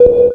Radar Button

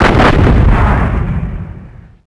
longtom fire; firestorm; Gameleap; mw4

